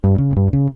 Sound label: error; Program Error